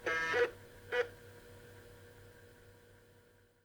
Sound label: floppy read short